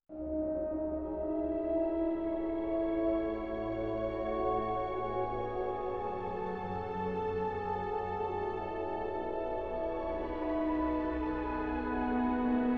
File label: classica